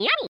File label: Eat; Berry-Dash